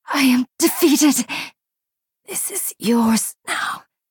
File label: last gasp; varanis courier